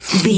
voice male cry snort

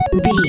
retro beeps success

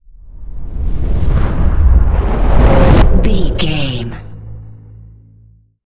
cinematic build up reverse whoosh

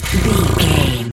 cat purr

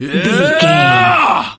voice male battle shout

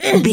voice female hurt pain mild

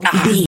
voice male hurt pain

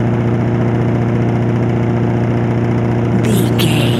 engine generator loop

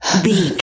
voice female breath run

